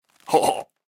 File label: woody low; alohaxmas